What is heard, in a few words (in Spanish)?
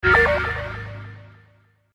reload, Ruleta